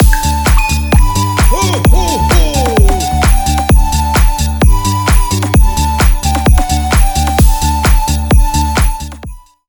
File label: alert sound raid